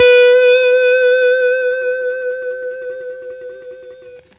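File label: blues long vib, Guitar; 90 s Sample CDs; Quantum Leap - Guitar & Bass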